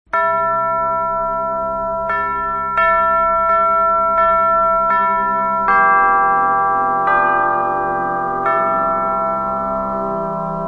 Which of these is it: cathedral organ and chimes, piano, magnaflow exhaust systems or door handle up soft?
cathedral organ and chimes